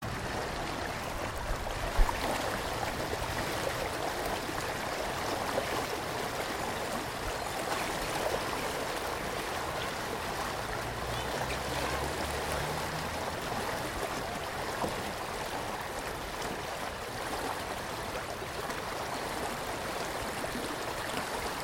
stereo soundscape of fast flowing thames at twickenham; rjnet